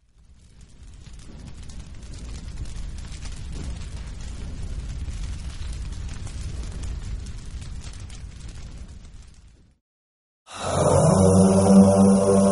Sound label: Noha; Shahadat Ali Akbar